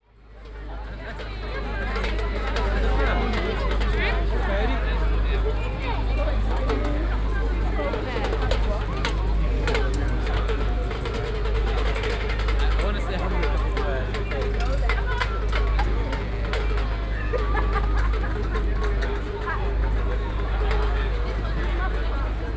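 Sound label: birmingham chinese newyear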